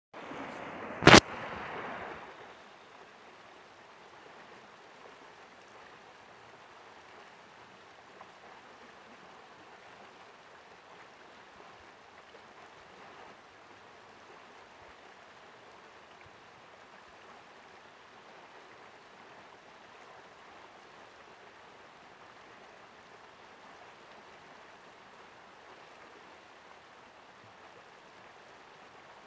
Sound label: falls sledding hill; berea